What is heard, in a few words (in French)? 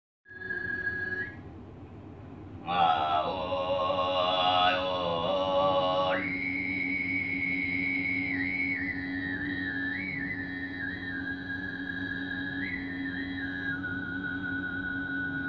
chant diphonique